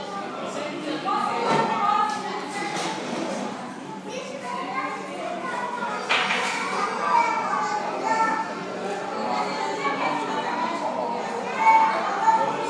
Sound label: children playground